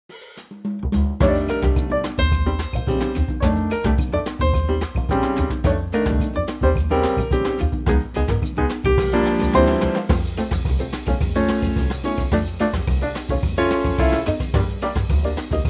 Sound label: F latin trio